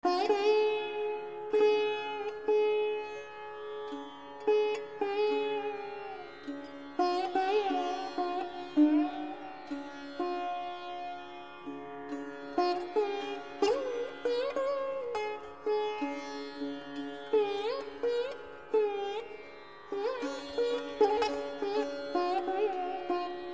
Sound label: instrument sitar